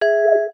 Wobble, notifications; Samsung; Galaxy A21s

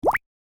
Water Drop Notification Evening; Xiaomi Redmi-Note-12-4G

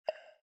Lock; Newman; CM810

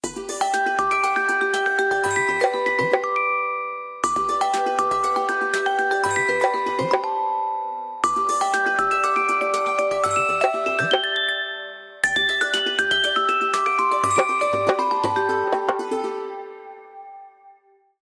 Utsav Beats, Ringtones; Samsung Z2

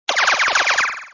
Bells; Hijacked by MTV

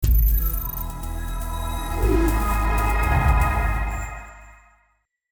Power On

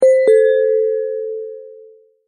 Door Bell, notifications; Asus Zenfone-10

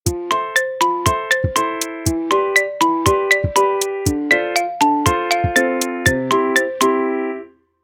Light, alarms

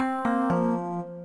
Disconnect

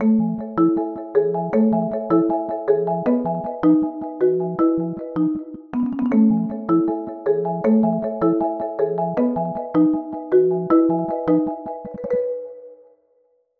Xylophonic, ringtones; Samsung Galaxy S26 Ultra

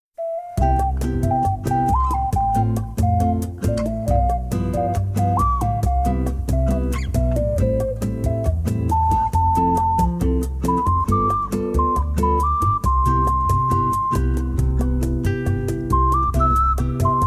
ocarina; LG; SmartWorld Soundbox

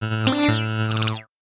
SMS

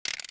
Send, Keytone sounds; LG GD550 Pure

